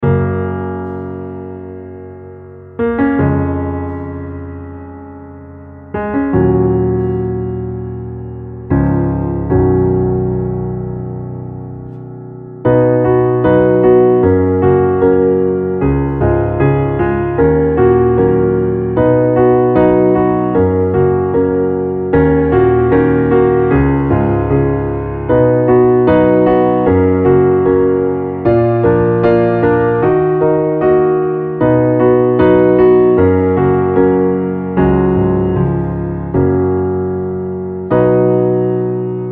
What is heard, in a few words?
Underscore PIANO; Worship Flow